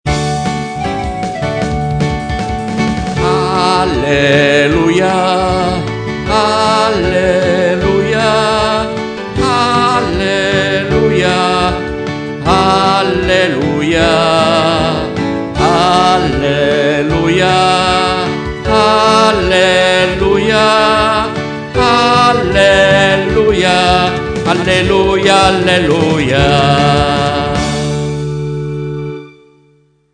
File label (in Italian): ALLELUIA cantato